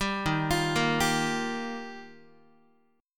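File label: E flat Major Add 9th, Guitar; Ebadd9 arpeggiated; 10,11,8,8,11,x; 4A,4E,5A,5D,5G#,6C#; JGuitar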